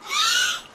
dolphin dying; minecraft; technic

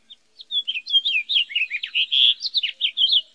bird blue; minecraft; technic

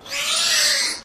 dolphin hurt; minecraft; technic

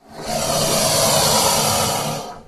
croc grunt; minecraft; technic

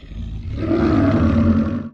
werewolf grunt; minecraft; technic

